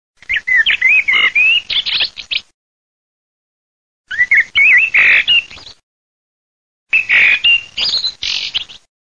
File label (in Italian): MERLO, birdsound